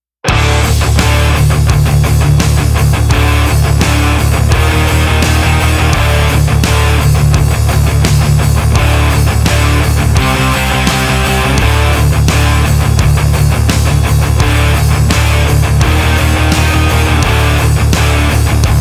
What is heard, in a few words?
stock music